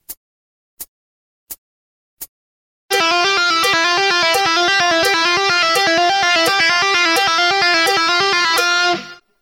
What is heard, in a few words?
lick, guitar